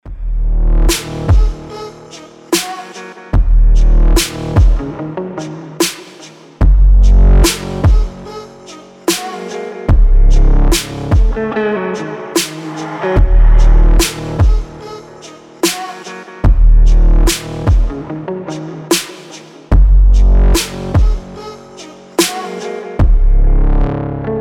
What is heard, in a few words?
quick musical doodles slowed